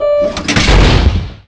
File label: Eject End